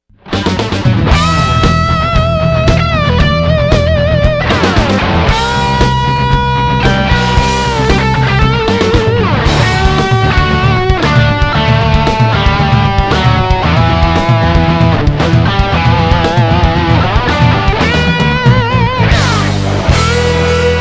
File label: British